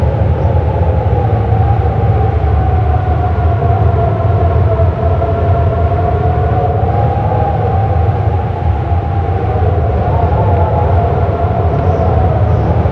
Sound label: Wind Cave Day Loop